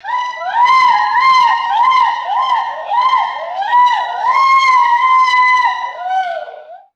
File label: wailing calls from indri lemurs; animals